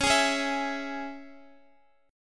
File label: Db Minor, Guitar; strummed; JGuitar